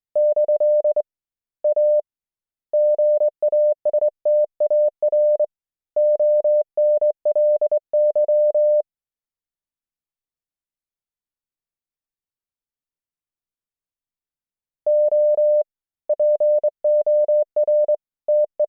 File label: morse cw